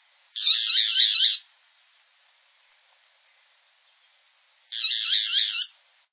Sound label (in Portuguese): neinei